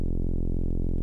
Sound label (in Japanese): ミニ・モーグ, 電鳴楽器; 最低音４音色